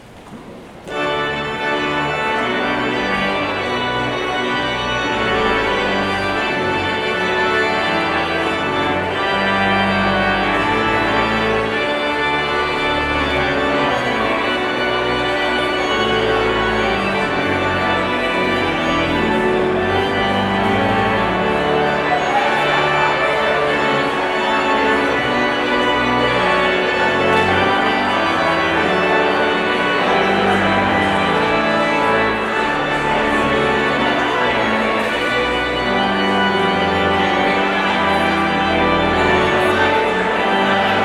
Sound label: Postlude